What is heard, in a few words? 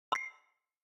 Message, notifications; Yota Yotaphone-2